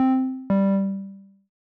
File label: Sonification Beep End x2